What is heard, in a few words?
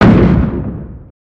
Siege Tower Distant; destructibles